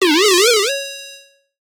cast spells, magic spell; 魔法/法术的音效

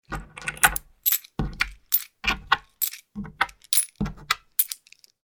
coin count; game inn

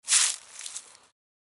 dry leaves footstep; 足音

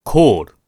Call, board game casino mahjong

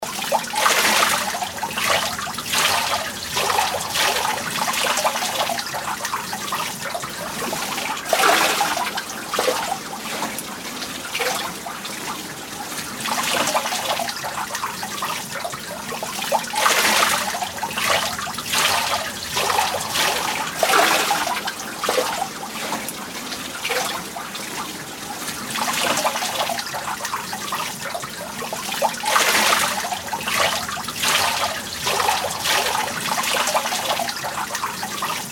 Waterwheel; 環境音

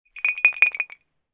Glass with ice sound

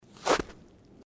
footsteps snow

